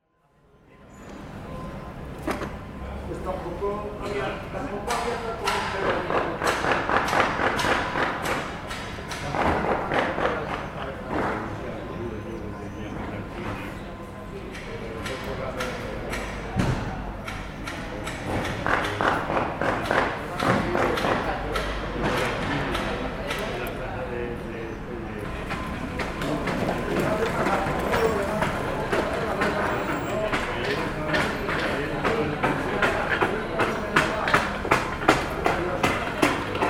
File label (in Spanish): Ambiente; Fraga